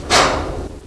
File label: metal